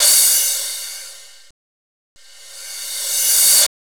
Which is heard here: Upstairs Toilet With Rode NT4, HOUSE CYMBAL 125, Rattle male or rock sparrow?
HOUSE CYMBAL 125